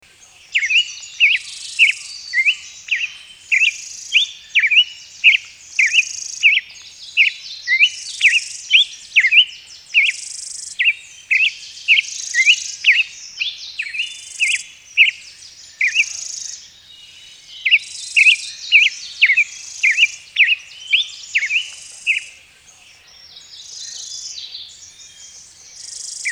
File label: Hepatic Tanager Piranga hepatica; birds-that-eat-grape-jelly